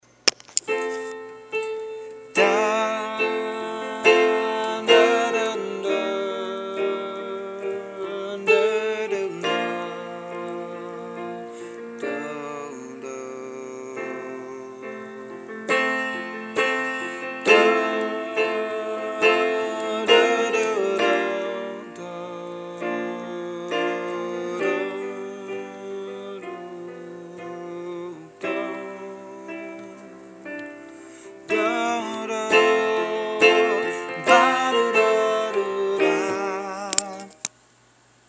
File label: Melody; Song Writing